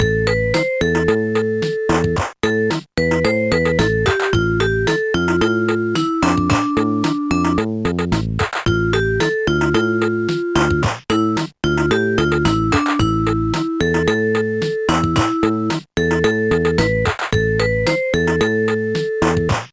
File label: latino; Sonix Music Driver